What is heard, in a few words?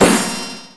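bubble pop; Finding Nemo; XBOX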